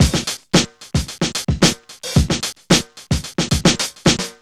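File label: 111 bpm, Funky loops; Zero-G; Funky Elements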